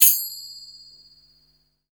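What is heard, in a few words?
perc fx, hip hop R&B; USB Soundscan vol.19; AKAI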